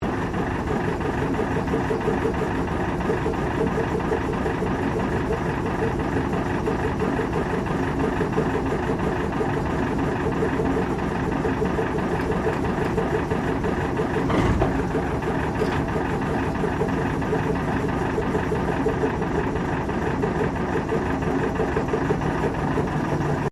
Train Diesel Shunter V8 Class 1955 Tasmanian Idling Loop; Bellarine Tourist railway vehicles